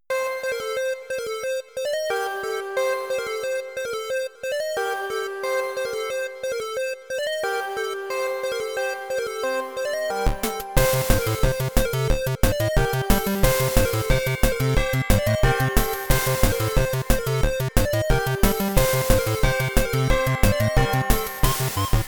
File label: Ugly Bell